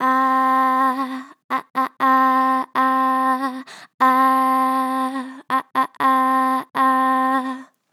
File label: Vib Ah Ah, Vocals, Natural Vibrato Strong; Harmor Vocals